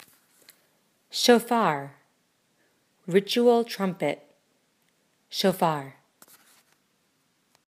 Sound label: ritual trumpet